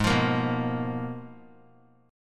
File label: G sharp Minor 6th Add 9th; G#m6add9 strummed; JGuitar